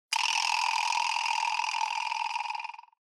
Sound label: Vibraslap Low Short